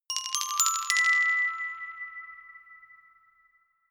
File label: notification glockenspiel rattle sequence ascend